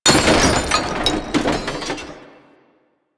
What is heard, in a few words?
desktop glass metal destroy; ambience; Warframe; Entrati